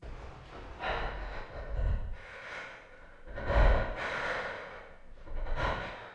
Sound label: breath; DirectX SDK; DMusProd